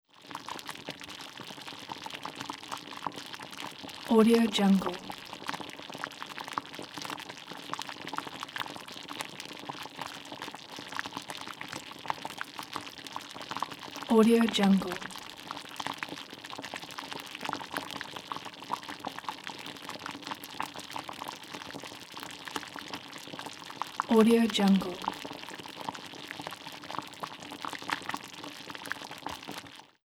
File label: thick liquid boiling